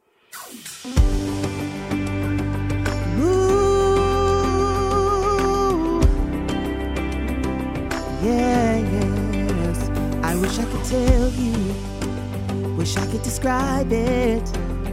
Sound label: Lead Only; christmas choir